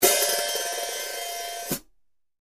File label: Hi Hats, cymbal; ZBT 14in HiHats